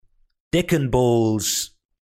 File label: dnb